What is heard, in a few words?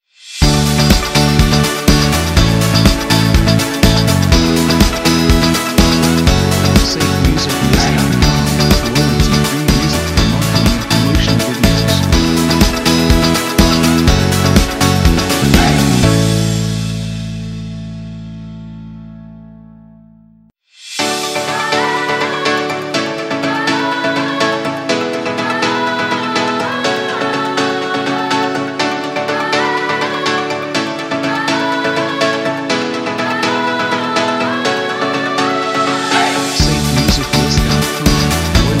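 Exciting Summer Party Intros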